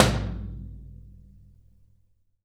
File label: tom, drum